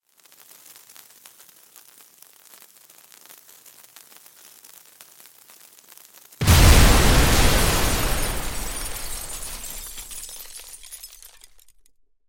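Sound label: fuse smoulders dynamite explodes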